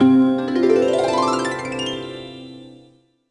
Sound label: Jingle Good; tamagotchi connection corner shop ds